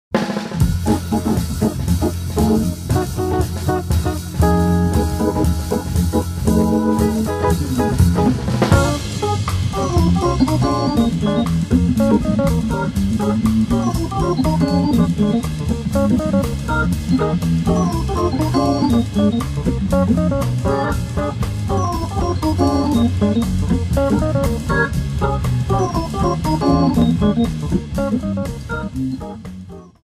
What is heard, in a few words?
slingshot